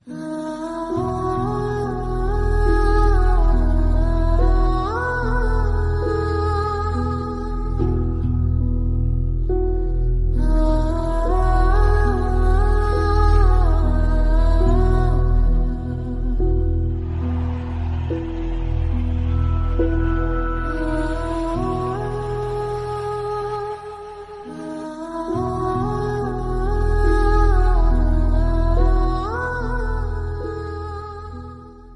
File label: Sad Bgm Ringtone